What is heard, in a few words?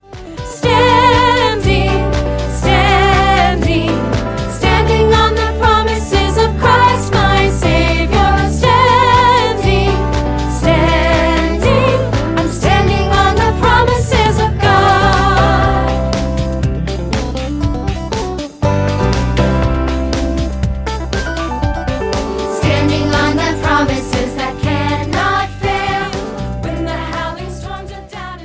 Instrumental